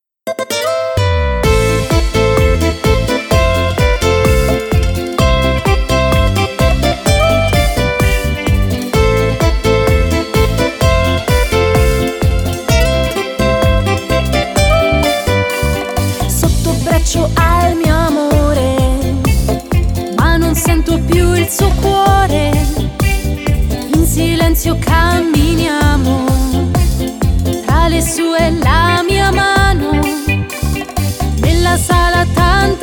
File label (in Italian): Fisarmonica